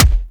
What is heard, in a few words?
Gunbrella Punch; Weapons; Warframe; Tenno; Koumei